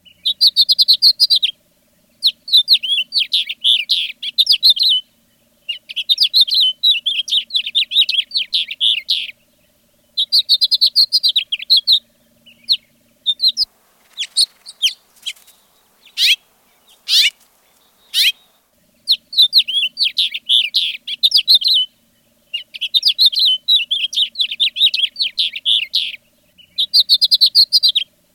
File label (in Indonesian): suara housefinch